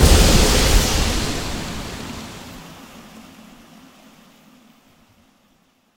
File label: Gas